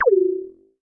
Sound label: laser; lasers